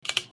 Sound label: tap